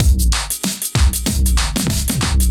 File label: Duo Swing Mix, 95bpm; off the grid